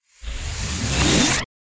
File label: Laser Sword Turn Off